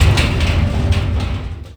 Metal Barrel Slide

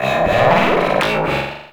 Talking Machines; Ambience; Warframe; Corpus Railjack

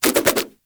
Nunchuck Spin Whoosh; Warframe; Infested; weapons

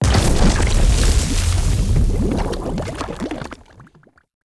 Lava Burst; Ambience; Props; Warframe; Tau; Circles Of Hell

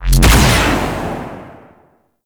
Alt Shot, pistol; Crp BE Plinx; Blastor Pistol

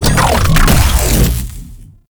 Mech Focus Start; Warframe; Nechro Mech; Abilities

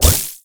Corruption Pickup Small Spawn; Warframe; Zariman; Missions

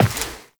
Step Default, Footsteps, Walk; Warframe; Tenno

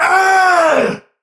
Pain Heavy; Tau; Dax; Battle Barks

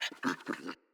Kubrow Puppy Idle Growl Playful; Vocals

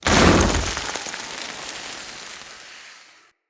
Fire Death Burst; Impacts

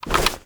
Tenno Alt Remove From Back, Foley; Warframe

